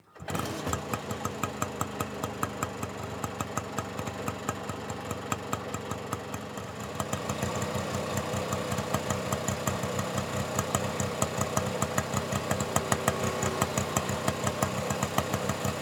Auto Tuk Tuk Auto Rickshaw 2 Stroke Start Idle Shut Off; Tuk Tuks; The AudioVille